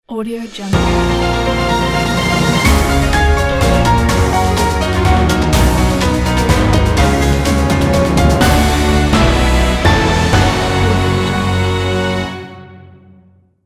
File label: news opener logo